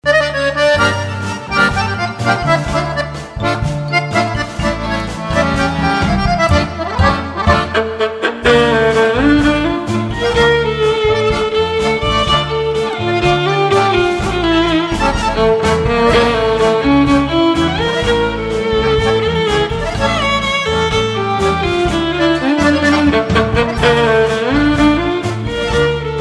world music band

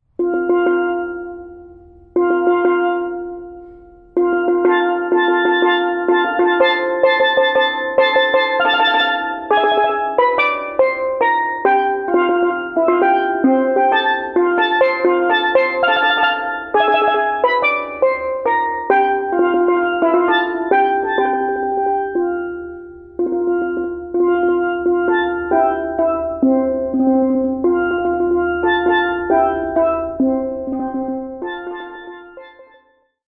solo steel drum; Wedding March

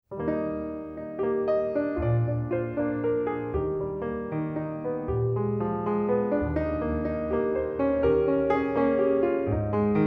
piano

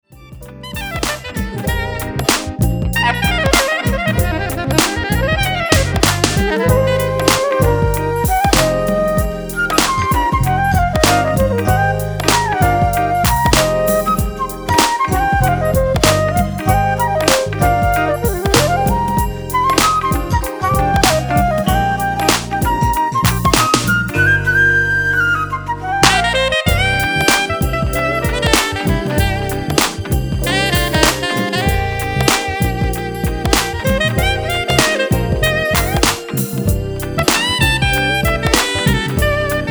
Sunshine, saxophone

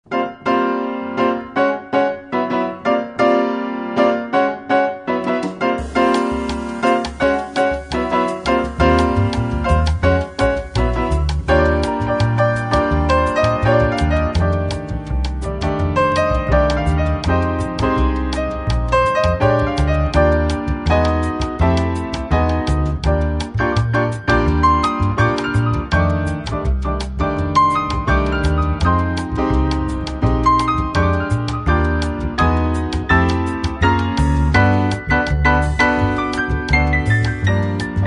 trio, piano

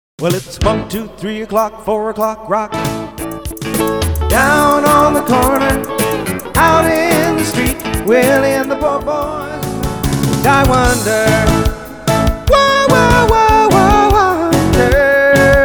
Rock

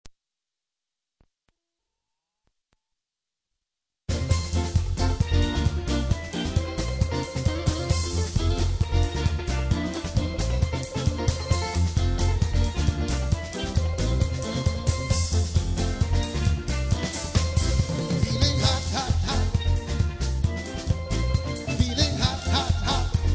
Reggae Calypso Soca Dance Band; caribbean

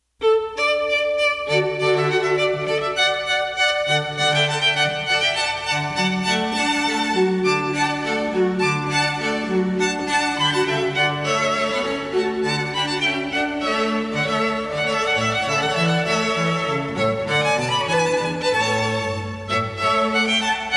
classical string quartet

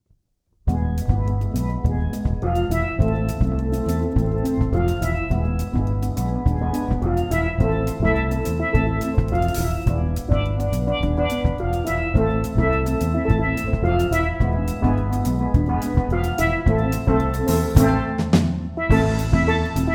Steel Drum Band

